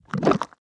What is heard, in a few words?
make bricks; Immortal